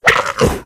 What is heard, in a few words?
claw miss, zombie